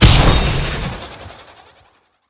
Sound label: frost spear; skyrim